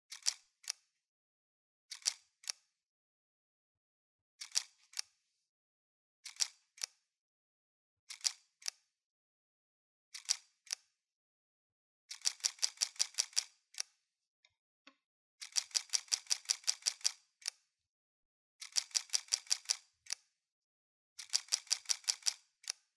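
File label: Nikon Df, camera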